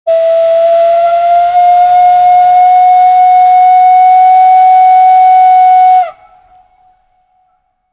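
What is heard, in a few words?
deep steam locomotive whistle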